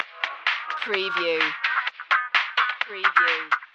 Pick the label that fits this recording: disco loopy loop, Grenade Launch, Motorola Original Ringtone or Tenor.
disco loopy loop